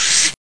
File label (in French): Balayet; MOBICLIC; histoire